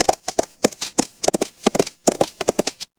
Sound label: animal horse gallop